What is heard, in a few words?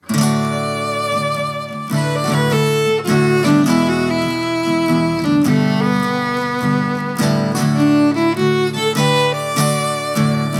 acoustic fiddle